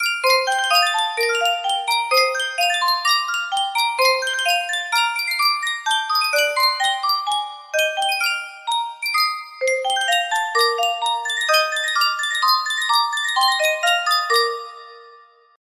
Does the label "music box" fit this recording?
Yes